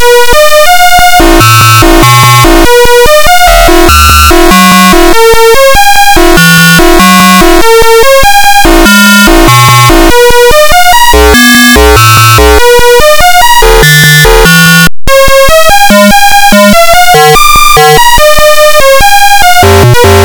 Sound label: tune; manic miner